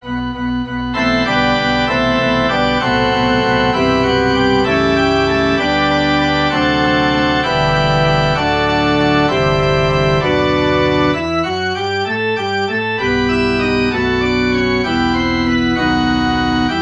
forspil; ddk 196 herre du vandrer forsoningens vej

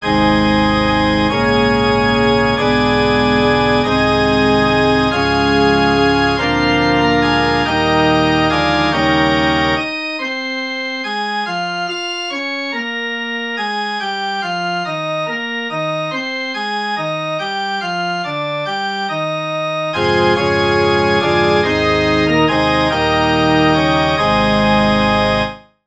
forspil; som forårssolen morgenrød

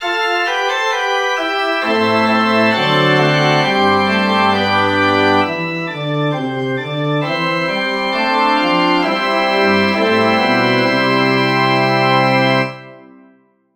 forspil

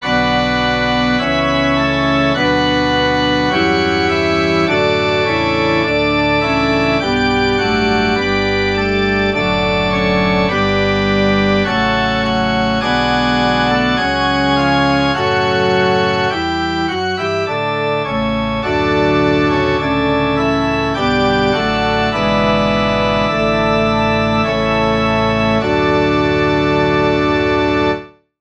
forspil; påskeblomst! hvad vil du her

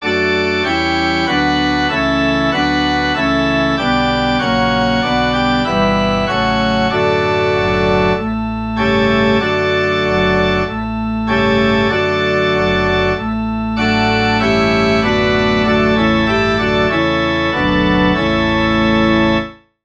forspil; jeg er traet

